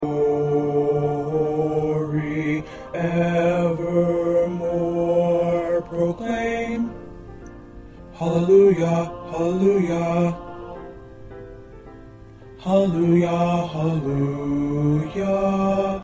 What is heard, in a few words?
1st Bass